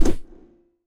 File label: Swing Throw